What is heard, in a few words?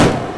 Snare; 摇曳百合